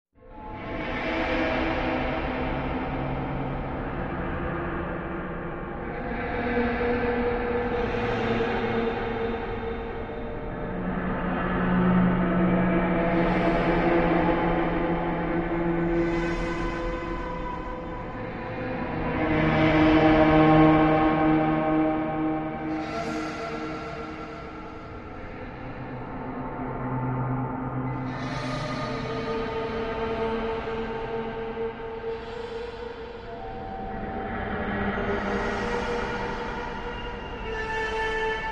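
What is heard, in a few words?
Eerie Distant Metal Scrape; ambience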